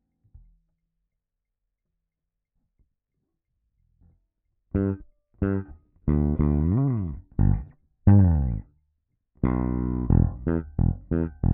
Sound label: Bass Line, RNR for piano